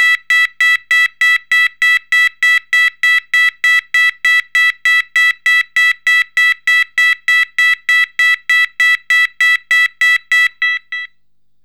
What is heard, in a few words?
Sounder